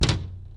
glass hit; hits

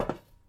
metal step; footsteps

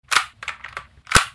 deathray reload; weapons

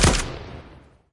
lewis gun fire; weapons

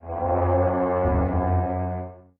kill leader assigned

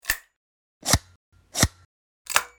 flues reload; weapons

